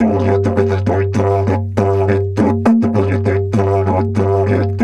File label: G 100, DIDGE 100BPM, Didgeridoo; Big Fish Audio - Didgeridoo and Other Primitive Instruments